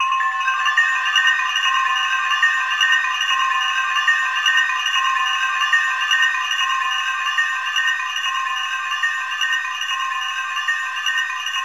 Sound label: MAD BELL; AMG - Vince Clarke Lucky Bastard